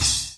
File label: cymbal